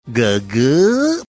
sticker shock sound

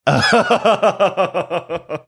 laughing

